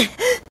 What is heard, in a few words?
Grunt; Lewd SFX